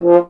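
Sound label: French horn, Solo Instruments; Miroslav Vitous; Solo Instruments VOL-2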